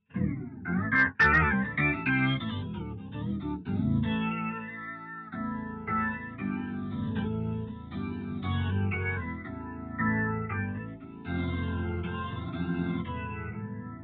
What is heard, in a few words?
Dirty Organ